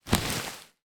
office packing peanuts in large plastic bag movement; zapsplat